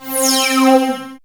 synth c, Sweep Synths

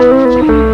Gtr Riff quick, wavetables; Animoog

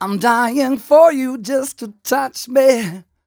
m 130 mel d-flat, Vocals

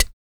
MALE PERC, Vocal Planet, Jazz FX; Spectrasonics

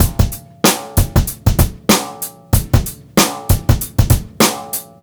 beat, Roots Funk; USB Soundscan vol.41; AKAI

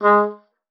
G#3, OB D.AMORE; Xsample VOL-7

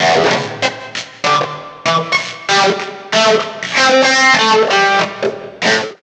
GTR

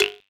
Cowbell; Equipped Music; Loungin House

